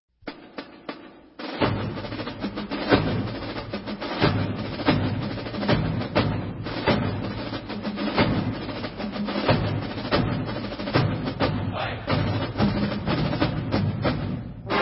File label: battle cry